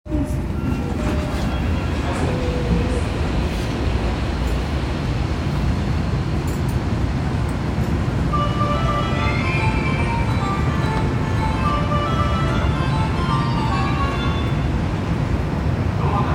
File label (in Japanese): 日比谷線広尾北, train sounds